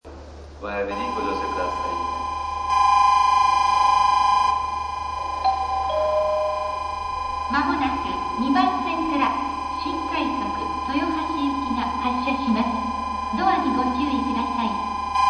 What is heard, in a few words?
nagoya bell; jrcentral